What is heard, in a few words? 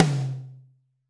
Studio Tom 1, Roland BK-5 Toms